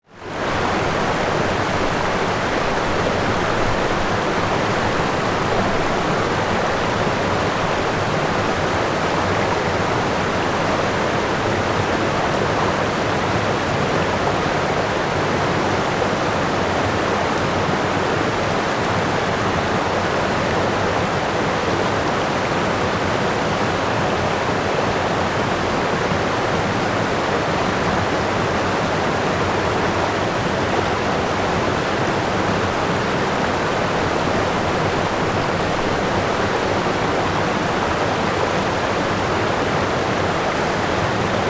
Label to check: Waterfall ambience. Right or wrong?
Right